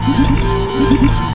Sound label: Teleporter Open Idle; A Robot Named Fight